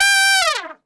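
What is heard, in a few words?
G 3 TRP SHF, Trumpet; Best Service; Brass Super Section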